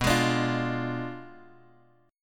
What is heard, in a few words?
B Diminished 7th B down Bass Standard; Bdim7 strummed